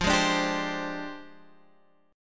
F Minor 6th Add 9th Guitar Standard; Fm6add9 strummed; 13,11,12,12,13,x; JGuitar

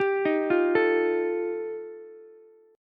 G 7th Suspended 2nd Sharp 5th G chord, Ukulele; arpeggiated; JGuitar

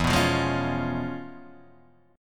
C sharp 7th, E; strummed; chord

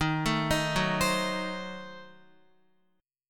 Eb Diminished 7th Eb, Guitar; Ebdim7 arpeggiated; x,6,7,8,6,7; JGuitar

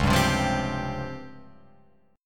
Db Major 7th Flat 5th Db down Guitar Open F; DbM7b5; strummed

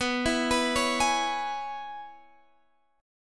Db Minor 7th B, chord

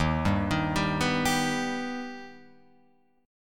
Eb Augmented, Guitar Open F; arpeggiated; 3,2,3,2,2,2

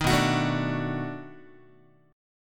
B 7th Sharp 9th, down, Guitar; strummed

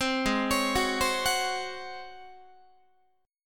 G sharp 11th G sharp, down, Guitar; G#11; arpeggiated; 15,16,16,16,16,17

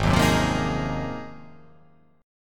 Ab 13th Ab down, guitar, Ab13 chord; strummed; JGuitar

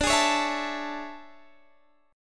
A Major 7th D; AM7/D; strummed

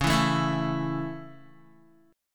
Db Minor Sharp 5th Db down Guitar; strummed; 4E,4A,5D,5G,5C,6F

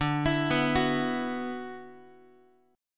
D 6th Add 9th D, Guitar; D6add9 arpeggiated; x,10,7,9,10,x; JGuitar

